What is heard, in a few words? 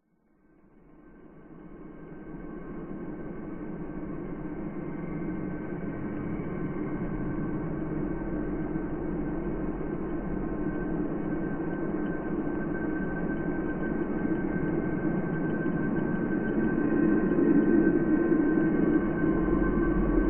science fiction drone planet atmosphere desolate windy weird shrill; zapsplat